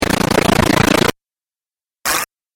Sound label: Dubstep Scifi Effects